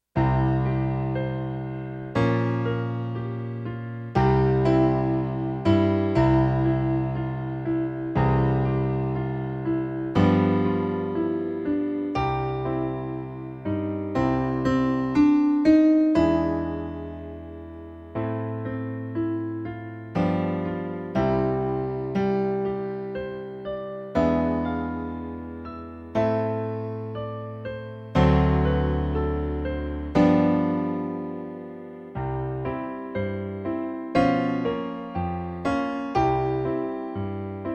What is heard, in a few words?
Piano Concerto (Piano Only)